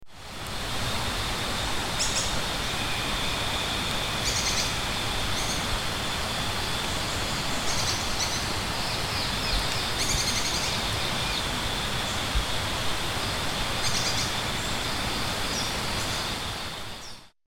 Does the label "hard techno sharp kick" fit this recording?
No